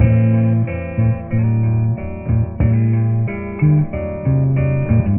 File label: guitar and bass; Loops